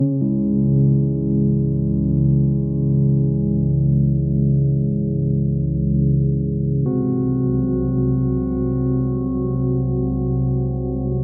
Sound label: Ambient Silent Strings